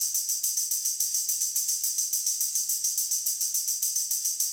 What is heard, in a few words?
Garage Funk Tambourine, 70s Analog Funk; Sonic Foundry (Sony Creative Software); What it Is!